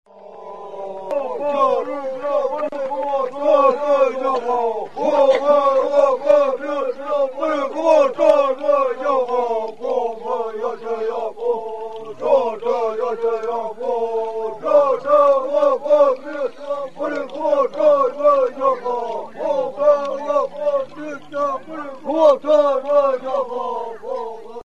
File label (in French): bresil